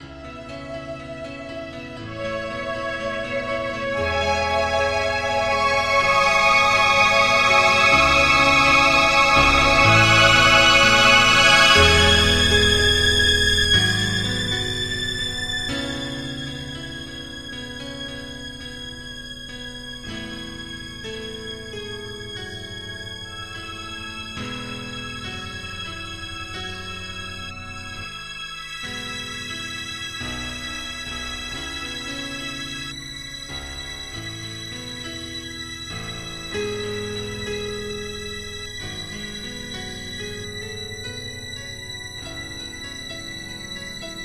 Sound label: Improv Echoes